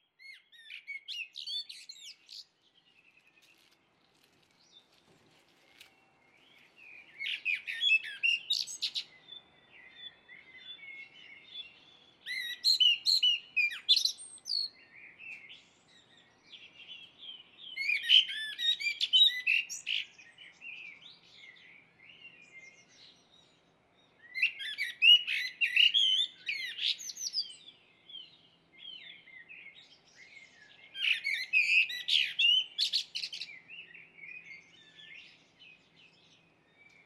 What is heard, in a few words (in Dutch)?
Merel, vogelgeluiden